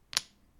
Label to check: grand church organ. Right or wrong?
Wrong